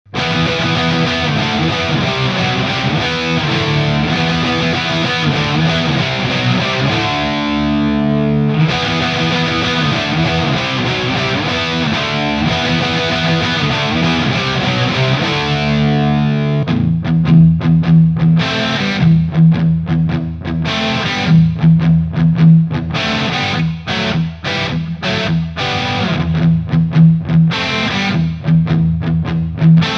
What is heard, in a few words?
Egnater M4 XTC, Gibson R8